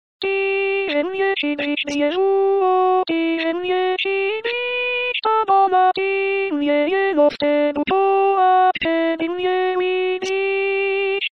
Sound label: Mazur Alto